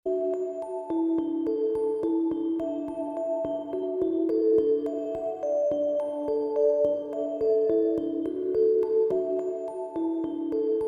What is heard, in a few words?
Autumn Ambience Full jam; Neon Jams